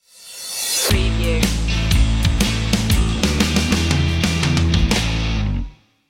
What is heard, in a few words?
isolation blues stinger